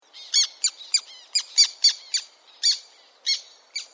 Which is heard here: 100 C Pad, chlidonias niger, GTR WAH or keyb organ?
chlidonias niger